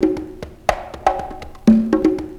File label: CONGA 120, PERCUS LOOPS